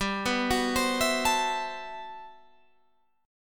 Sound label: G Major 13th; Listen to GM13 arpeggiated; GM13 Chord